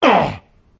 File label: elf wound